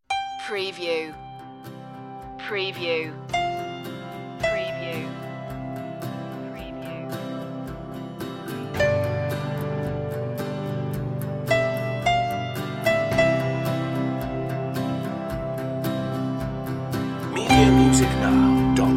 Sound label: finding the way home piano lead